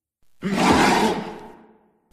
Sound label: big grunt